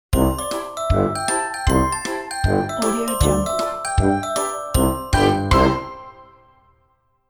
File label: fun childrens cartoon logo